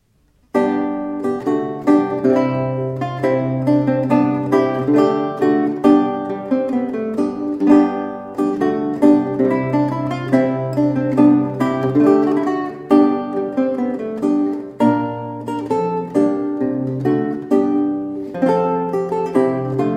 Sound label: Polish dance, Lute; Matthaeus Waissel